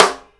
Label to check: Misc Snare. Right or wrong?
Right